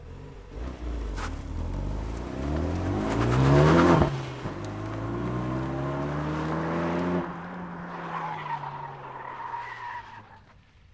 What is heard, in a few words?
start go away megane rs, Sport car; Chupapsound